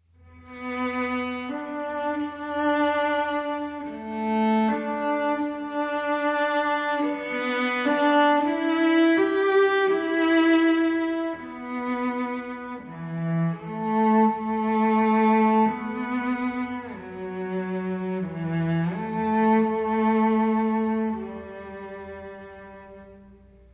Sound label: Cello; To A Wild Rose